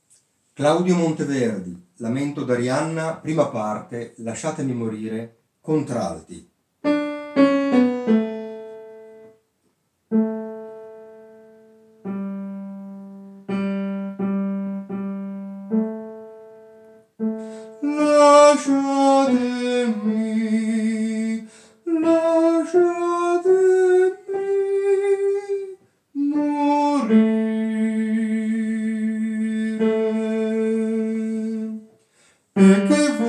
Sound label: Lasciatemi morire contralti